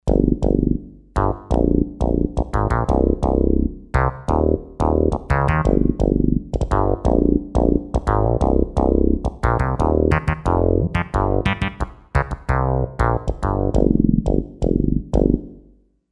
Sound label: Kawai K5000S; Bronx